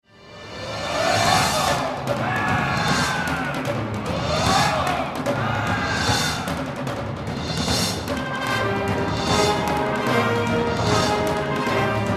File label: Drums of Thunder; Gala Concert 2006